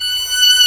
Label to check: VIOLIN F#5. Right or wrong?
Right